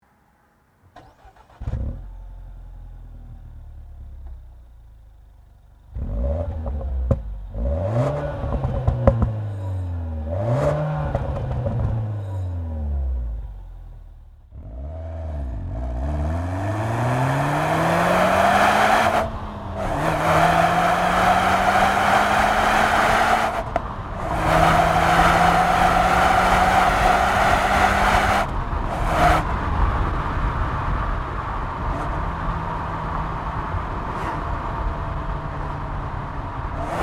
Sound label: Mini Cooper S Countryman